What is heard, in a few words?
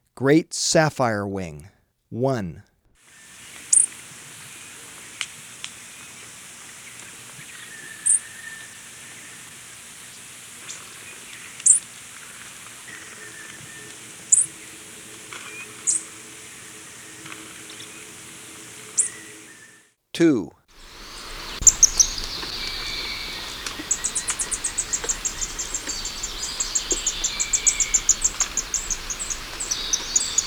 Great Sapphirewing, Birds of Ecuador; S. America